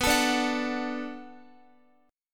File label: B Minor 7th B Guitar, chord; Bm7 strummed